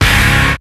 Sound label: hard guitar